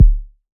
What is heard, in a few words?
BD, Waldorf Blofeld